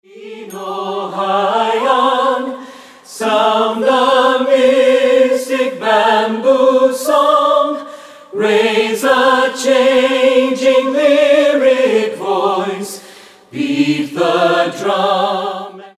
a mystic bamboo song

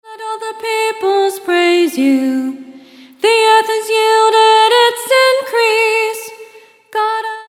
psalm chant

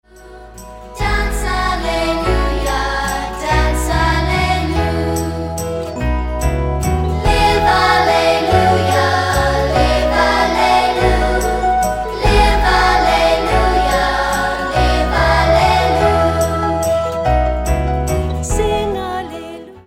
sing alleluia

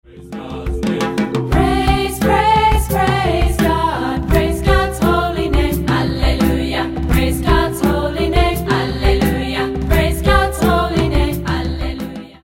african processional

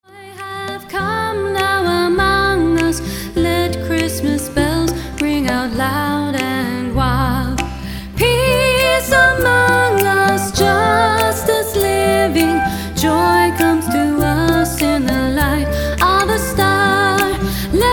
christmas bells

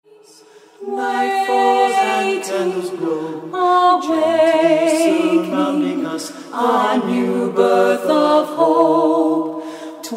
Advent Chant

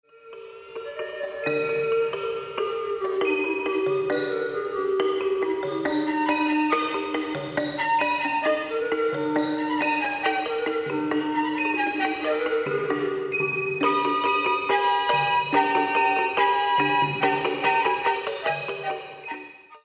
instrumental

